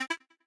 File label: Unlock